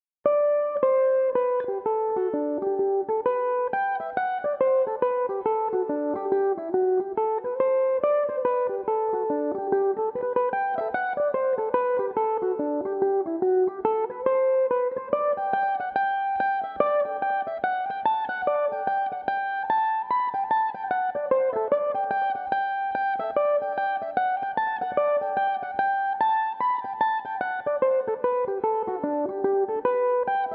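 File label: chattering magpie g major, mandolin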